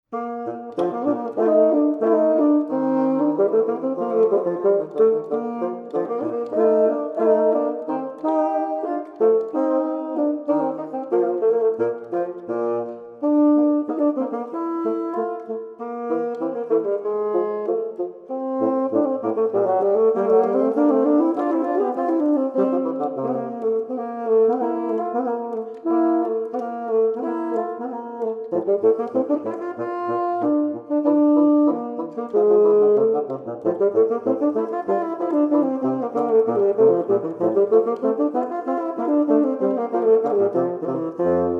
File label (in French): basson mozart